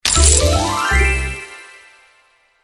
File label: win